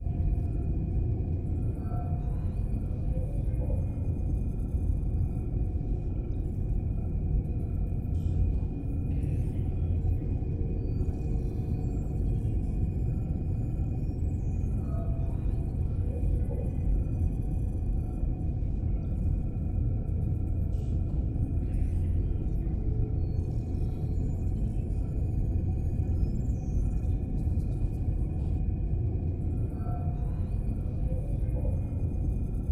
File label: Ambience Spawn Shuttle